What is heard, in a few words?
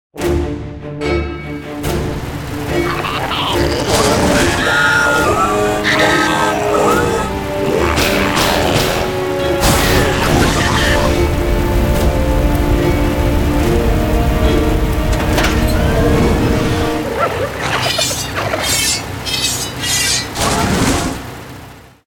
music boss intro; GOBLIN MECH